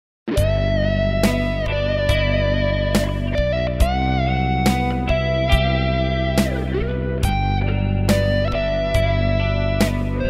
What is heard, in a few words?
Tri reverb; Efectos Biyang